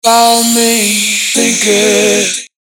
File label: Vocals Unearthed Breath